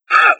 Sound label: pain, hazmat zombie; doom3